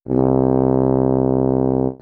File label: tuba